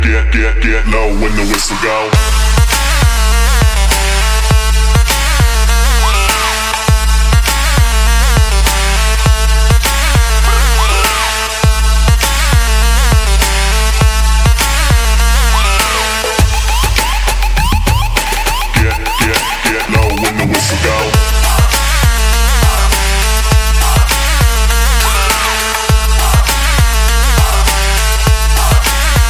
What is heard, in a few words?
Ringtone for Android Mobile; ringtones